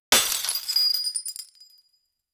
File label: realistic dramatic sound; destruction